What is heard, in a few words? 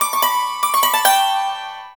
YANG CHING, Heart of Asia; Spectrasonics